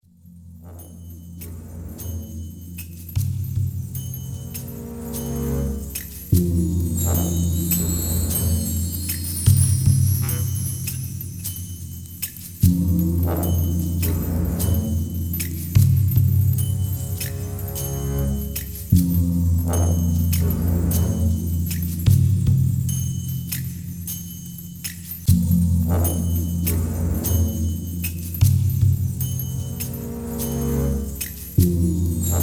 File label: Amb Indoor; The Hobbit; PS2